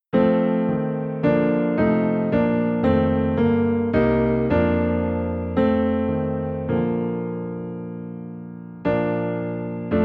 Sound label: Abide With Me, Piano Low in B Flat